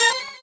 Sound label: normal hit normal